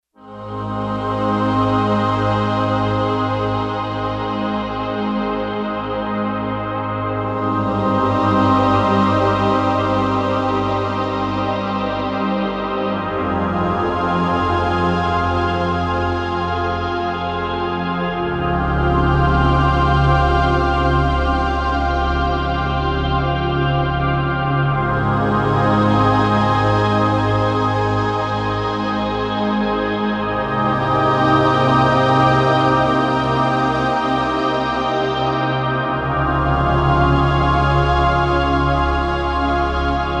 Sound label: Slow Pad; Digital Synthesizer